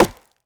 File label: tree impact; Rust